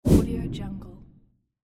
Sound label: whoosh